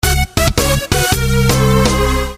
failure; tf2ware ultimate; music game; tf2ware classic

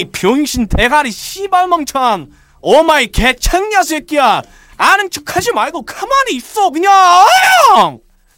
positive vocalization; scout

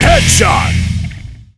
headshot

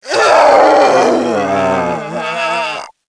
kid zombie death; nmrih; childrenofthedead; zerosiki; slender

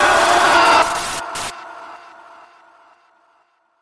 damaged; missingteam

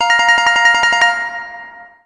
kart last; kart race

